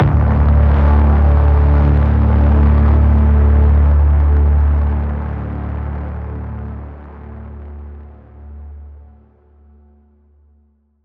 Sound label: Braam (D), Trailer; Federico Soler Fernandez; Effective Trailer Braams